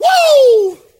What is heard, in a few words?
"woo"